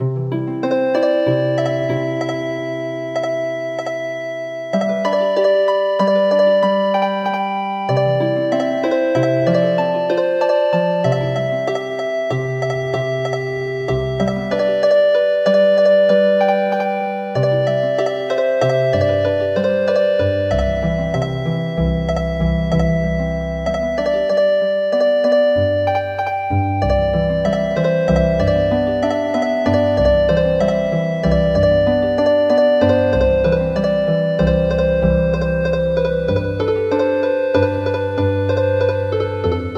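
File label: Healing Harp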